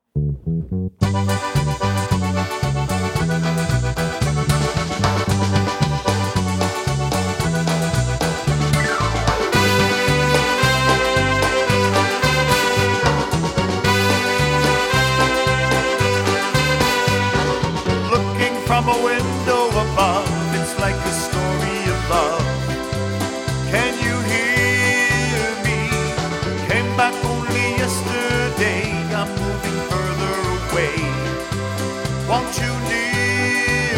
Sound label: Polka